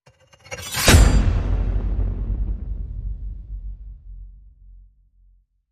Cold Skin Knife Boom

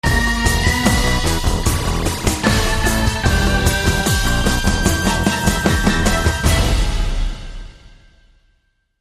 Funky Android, End Sting

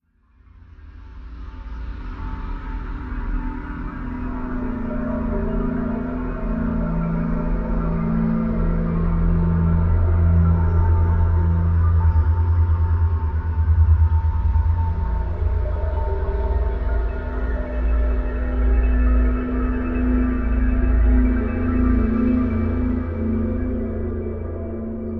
Drone Pipe

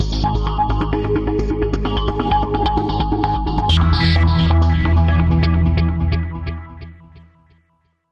Sonic Pulse Drone

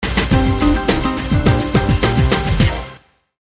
Hot Salsa, Sting

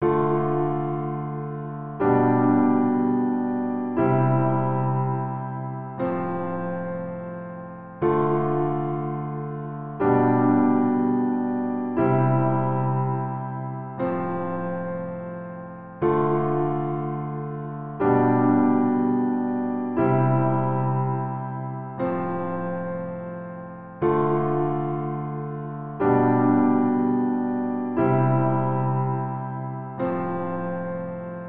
Piano Solo

